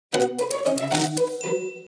iphone typewriter

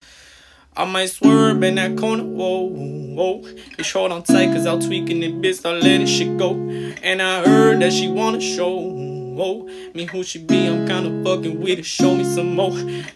acoustic

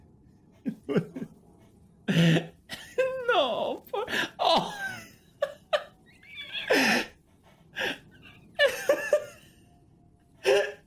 laugh; pewdiepie

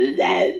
zombie

